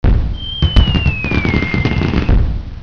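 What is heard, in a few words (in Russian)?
праздничный салют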